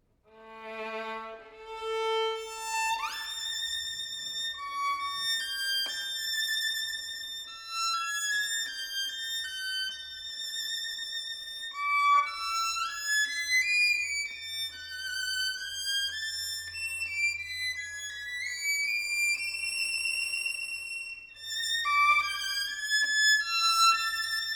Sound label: Solo Violin Studies